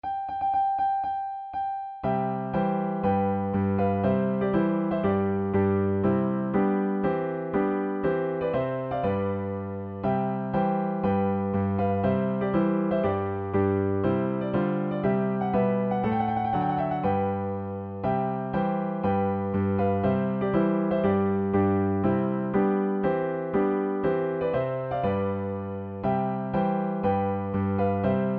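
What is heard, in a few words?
Trumpet Tune, level2violin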